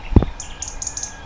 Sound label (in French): Cris rouge gorge